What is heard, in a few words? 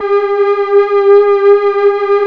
3100 G 5, Korg PS3100; Back In Time Records; Korg Universe VOL-1